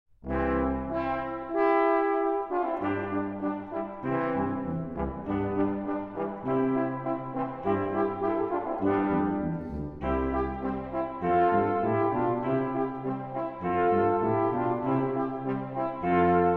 Echo of Horns throughout the Castle, brass quintet; dragon quest